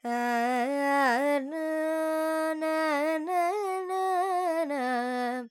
Dorian, Vocals Carnatic Female Alaps 70 G Dry

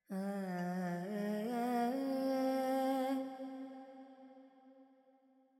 Pentatonic, Vocals, Carnatic Female, Alaps, G

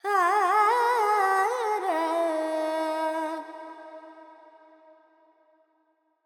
FX, Vocals, Carnatic Female, Alaps, Charukesi

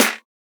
Clap, Percussion; Sample Banks

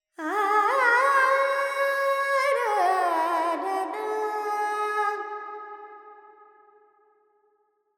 Verb Charukesi, Carnatic Female Alaps; Sample Banks

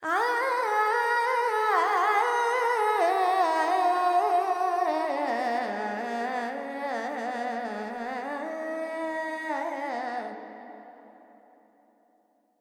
Verb Dorian, Vocals, Carnatic Female, Alaps, 70 G; Sample Banks

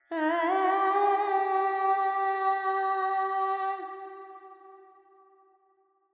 Carnatic Female Alaps, Panthuvarali, 100 G, Verb

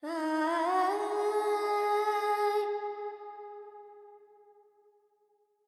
Verb Phrygian, Vocals, Carnatic Female, Alaps

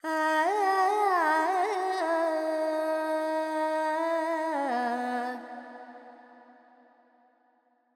FX Phrygian, Carnatic Female Alaps; Sample Banks

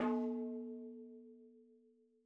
madalam notes R; MADALAM 110 7 8; Sample Banks; Percussion; MADHALAM

